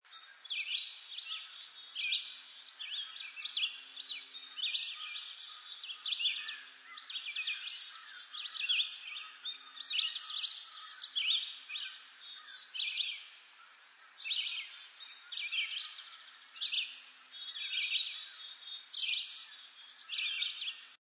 Nature Ambience Birds In A Park Loop, Daytime park ambience. Light chorus of various small bi; Daytime park ambience. Light chorus of various small birds chirping moderately. Seamless loop.; LynneMusic